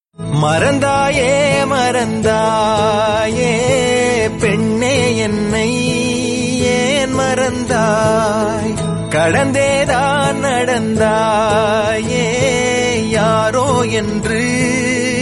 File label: song ringtone